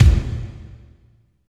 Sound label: KICK, Tekno Industrial; East West